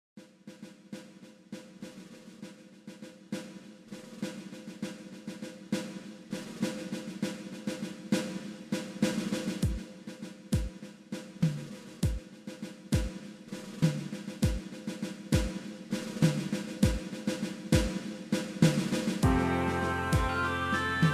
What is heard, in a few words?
BAGPIPES